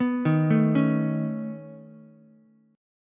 C sharp 7th Suspended 2nd Sharp 5th, C sharp, down, Ukulele; arpeggiated; chord